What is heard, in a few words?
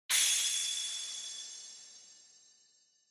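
Onoma Flash Dry, 光る; オノマトペ 光る12-1(ドライ)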